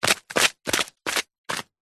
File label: foley footsteps walking down stone steps flip flops